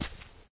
step; Smite; Xbalanque

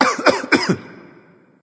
clear throat; Smite; bacchus

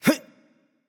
grunt jump; smite; tsukuyomi

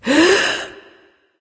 nv breath; smite; awilix

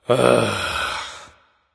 sigh; smite; voicepack; ullr

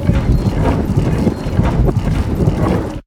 ball; smite; chronos

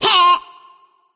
nv tongue; smite; voicepack; ratatoskr

